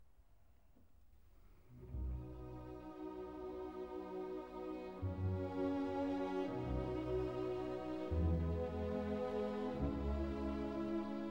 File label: Piano Concerto No 5 In E Flat Major, Adagio un poco mo; Adagio un poco mosso; Beethoven; Op 73; Emperor